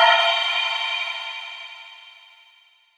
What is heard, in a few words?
wisp destroy; weapons; dota; keeper